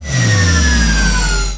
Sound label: power down, shield